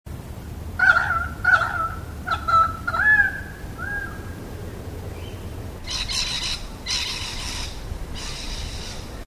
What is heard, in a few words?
dusk; Knoll NP